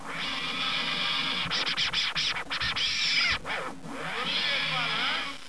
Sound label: scratch; Soccer Riot